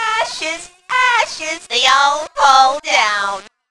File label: singin; funny; c00lkidd